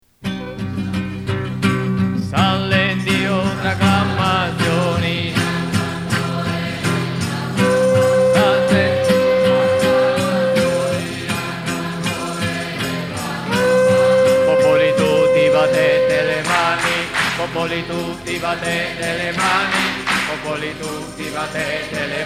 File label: shofar; Sale Dio tra acclamazioni